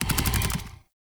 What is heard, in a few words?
Infested Big Ashen Move Turn; Warframe